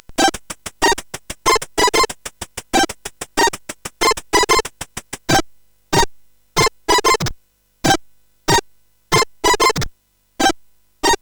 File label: Another Sound, CSG8580R5; hvsc